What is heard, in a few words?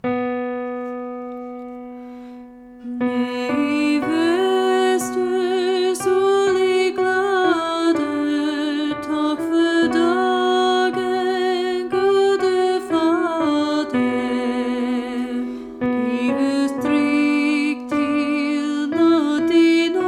Unison; Norwegian Trilogy 2; elementary honor choir rehearsal tracks